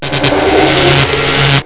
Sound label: ZAP; DOOM